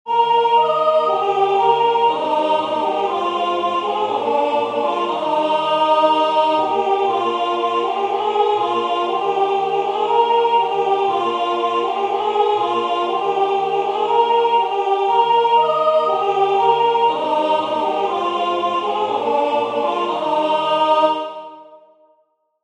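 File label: Still Still Still soprano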